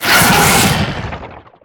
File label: Harpooned; Evolve; Wraith